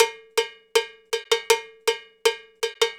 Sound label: Cow Bell, Latin Percussion